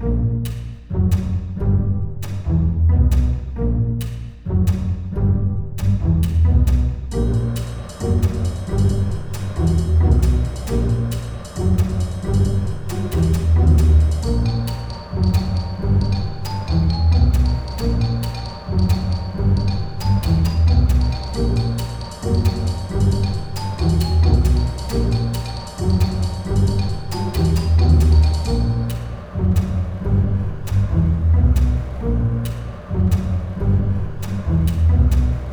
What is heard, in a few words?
Menu Music